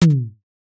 wooden tap

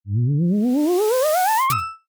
energy charge; horror sf

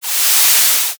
pan sizzle short

